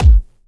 Kick, Trap

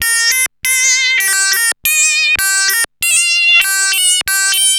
Arp E Line, ARP Odyssey; Lines; synth; musicradar-synth-samples

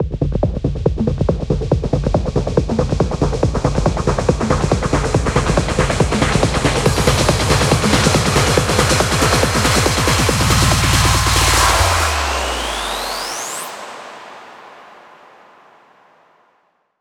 140BPM 8Bars, HandsUp & Trance Fills; UltraViolet; Vengeance Samples

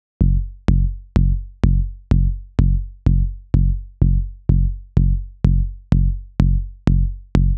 126BPM Bass Root F#; Vengeance Samples; Malta Kit

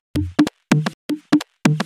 Electro Loop 128 BPM; Vengeance Samples

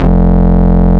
Root F, Bass

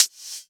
Open Hihat; Vengeance Samples; VEE Cymbals

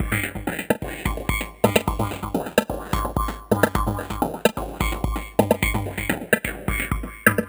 VFX2 Loop 128 BPM

